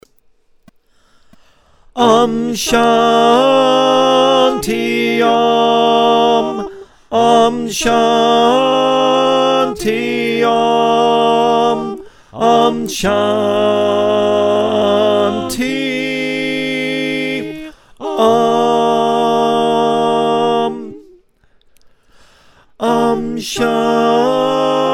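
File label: Tenor, choir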